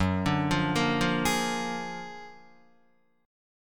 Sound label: Gb Augmented Major 9th Guitar; Gb+M9 arpeggiated; JGuitar